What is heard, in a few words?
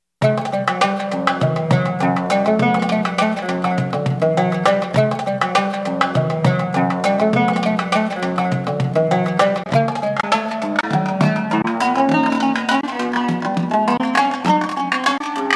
PITCH CHANGE A, Yamaha REX50